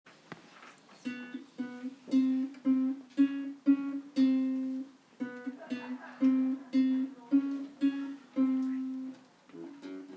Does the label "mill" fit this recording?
No